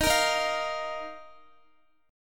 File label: D sharp Suspended 2nd, Guitar; D#sus2; strummed; x,x,15,15,15,13